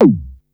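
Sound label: tom synth, Yamaha Motif ES-Series; 300 Drum Machines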